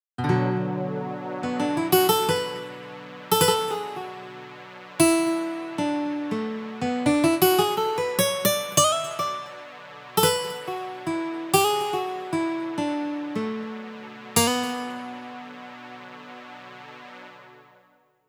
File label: guitar pad